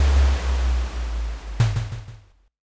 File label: drawbridge